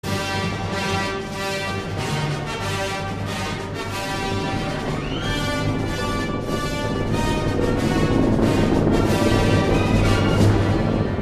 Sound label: star wars alarmierend